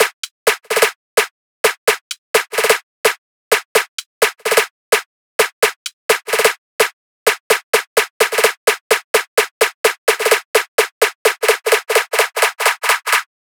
Drum Buildup, 128 BPM; Drums; Loops; Cymatics; EDM Starter Pack

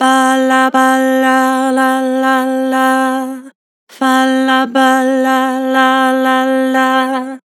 Vibrato Multi, Vocals; Harmor Vocals

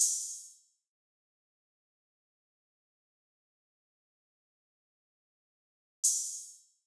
Drum Loop 159 BPM Open Hihat; Cymatics; Mystery Pack Vol 4; Runner

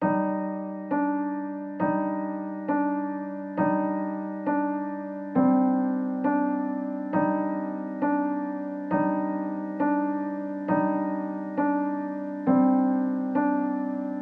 Piano, 135 BPM C Min; Loop Stems; Cymatics Gems Vol 12; Gems Free Teaser Pack; Monster

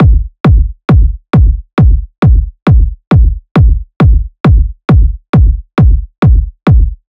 Kick Loop 135, Techno Drums; Ghosthack; Techno Sounds 2025

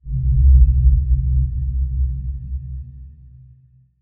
Distant Soft Rumble; User Interface

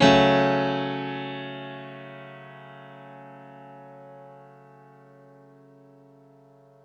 One Shot Piano 140 BPM (F# Minor), Grand Piano; Instruments; One Shots; Ghosthack; Guitar And Piano Samples

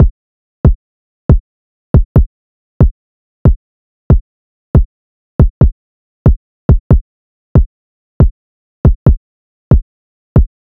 Drum Loop - 139 BPM Kick, Hip Hop; Drum Loops; Loop Stems; Cymatics; Monaco

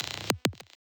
Glitch

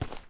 Footstep Dirt Medium; Footsteps

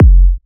Techno Kick; Ghosthack

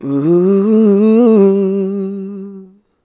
Uuuh, Male Vocals, Ad-Libs, C Major 150BPM; Ghosthack - Free Male Vocals and Atmospheres

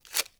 Paper Quick Tear

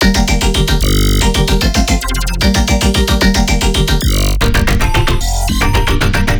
Melodic Bass Loop G 150 Electric, Color Bass; Loops; Ghosthack - Color Bass Essentials

